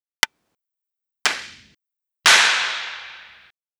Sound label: clapping